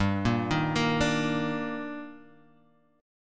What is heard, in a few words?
D sharp Major 7th G